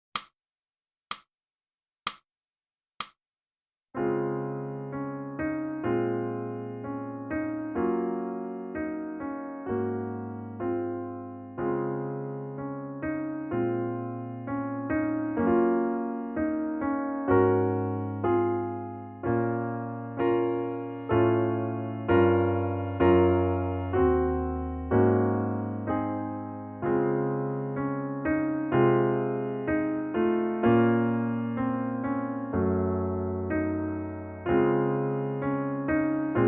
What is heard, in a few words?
sax